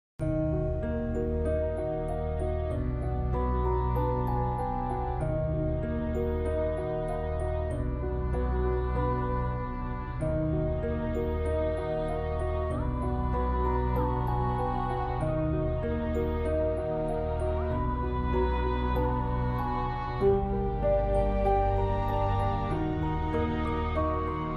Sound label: Piano; The Game Theme 2